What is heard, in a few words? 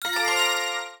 e911 tone; LG VS750 Fathum